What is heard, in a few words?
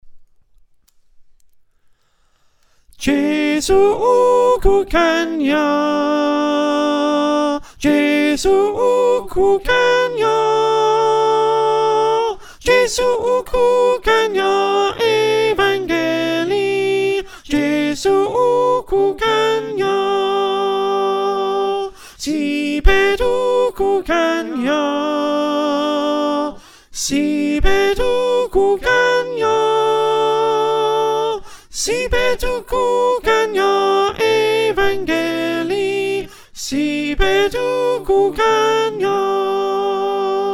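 soprano, choir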